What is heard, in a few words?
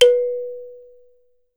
THUMB PI, African Melodic, Old World Instruments; E-MU Producer Series Vol. 7 – Old World Instruments